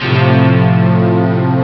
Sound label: stack; ST-XX Amiga Soundtracker Sample Packs